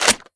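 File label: change handle forward; cstrike; valorant; rgx 11z pro vandal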